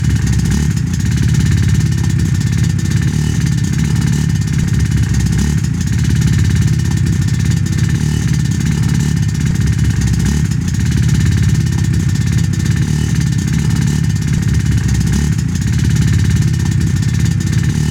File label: idle in, Kart